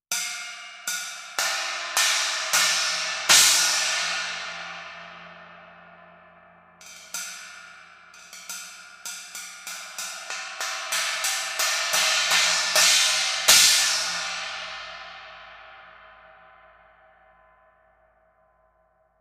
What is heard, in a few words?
Mist 20 Inch Brilliant China; 20" Diamond China